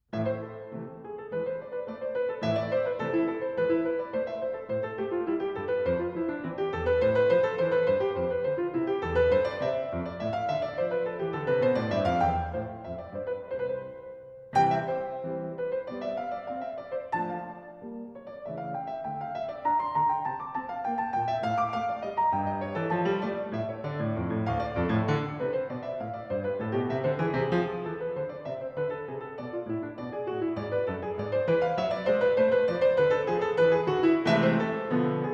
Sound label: Scherzo; Partita no. 3 in A minor, BWV 827